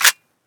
pp19 bolt release; bizon; garrysmod

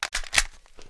sg550 mag in; garrysmod

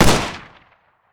mac11 fire; weapons; garrysmod

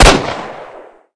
deagle colt, weapons; garrysmod

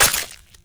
slash, blades; garrysmod

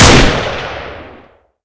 masada unsil; garrysmod

